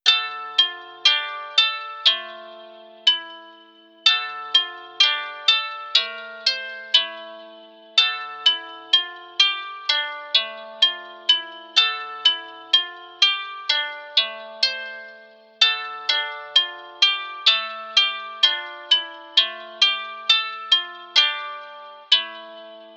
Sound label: Harp; Harp Rome